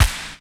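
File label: electronic bass drum; Best Service; Hyper Dance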